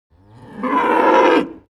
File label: animal cow moo single short; smartsoundfx